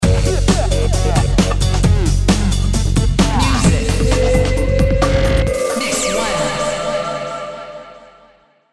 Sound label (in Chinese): 片头音乐